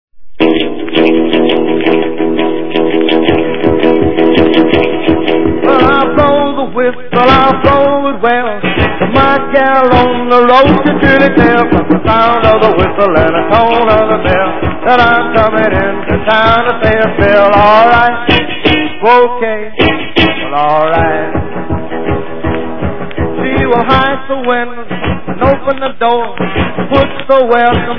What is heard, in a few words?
train whistle boogie